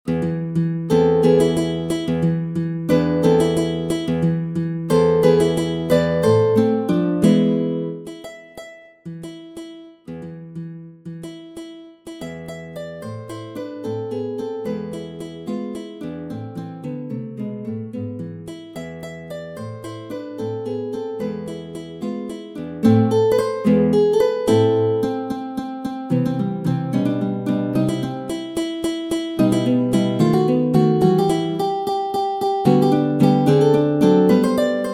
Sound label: Classical guitar; Matteo Carcassi; Op. 50 Récréations Musicales; No. 21 La Clochette de Paganini (La Campanella)